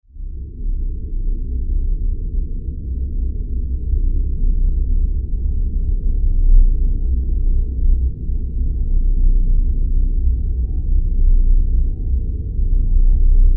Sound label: Spaceship ambience loopable interior cockpit or hallway